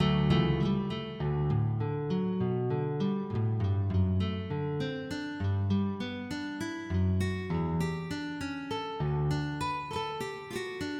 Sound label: Prélude Ré Majeur, MIDI; dEmond